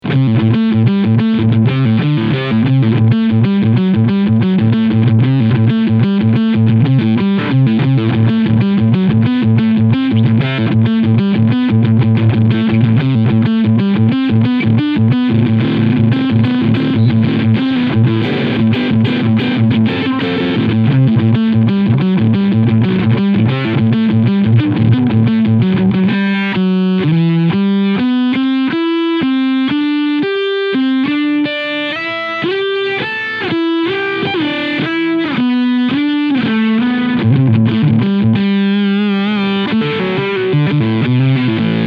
Germanium Filter Drive; Death by Audio; 8 Uhr; Filter 3 Uhr

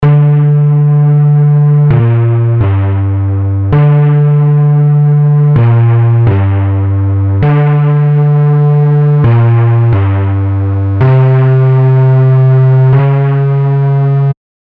Bass Punchy Dirty Retro Macro Modulation; Heavyocity Mosaic

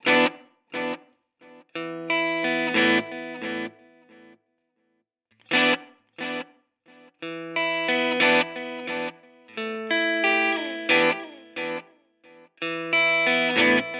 Nux MG-30 Delay Boss Digital

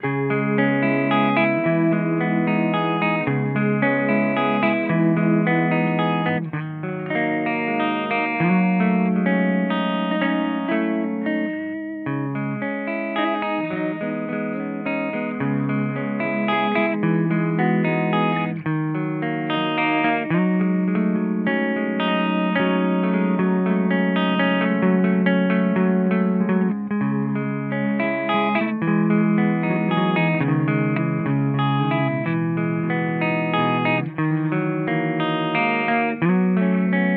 Cort MBM 1 Peavey Both clean

